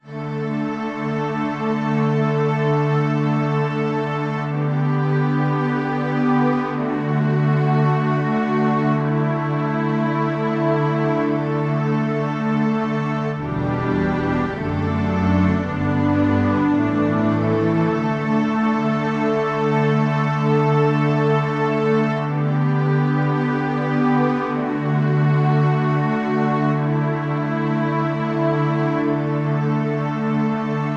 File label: Underscore PAD; Worship Flow